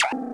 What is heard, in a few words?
MENU; PC Gamer IT CD 49; UrbanChaos